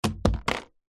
foley plastic tub lid drop on concrete; zapsplat

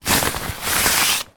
foley jacket raincoat hand rub movement

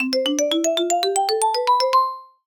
comedy marimba ascend; sound effects one

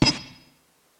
glitched tones Cable FX

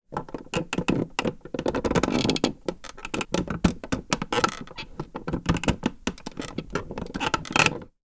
foley wood creaks heavy object

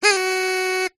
foley party whistle horn blow x1; zapsplat

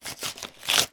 foley envelope letter open hands

